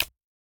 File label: mobile phone open